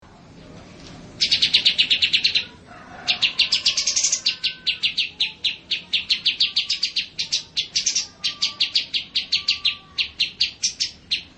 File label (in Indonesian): kapas tembak